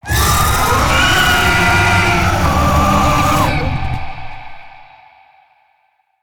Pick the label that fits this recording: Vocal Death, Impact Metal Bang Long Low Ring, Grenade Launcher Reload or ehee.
Vocal Death